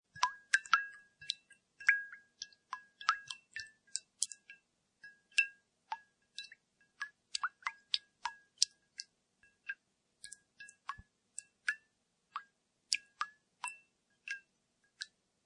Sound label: Water Drops; Water dripping